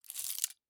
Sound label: Leaves and Twigs Rustle; SoundsCrate